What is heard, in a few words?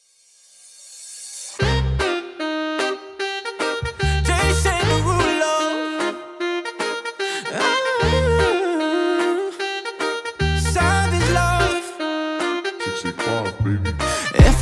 Siren Beat